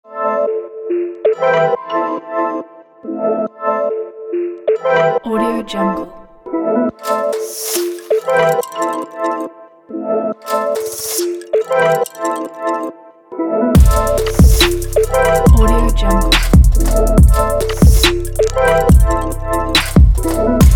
abstract fashion kit

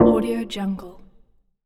warning

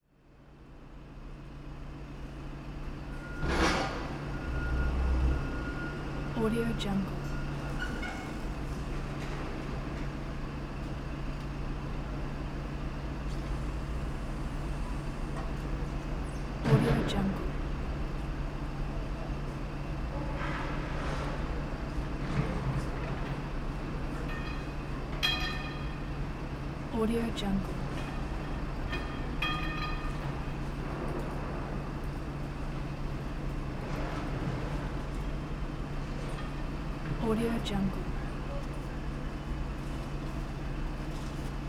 construction square ambience

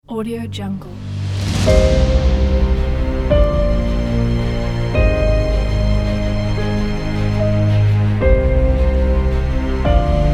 the epic cinematic

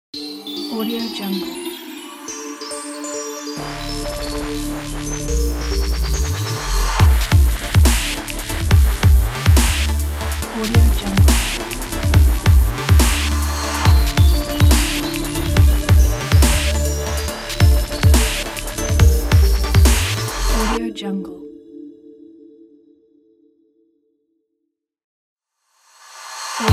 aggressive upbeat dubstep logo; musicdog

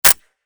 musket rifle dry fire

